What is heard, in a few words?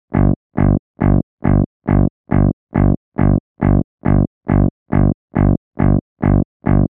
Reverse Bass Loop G (138 BPM)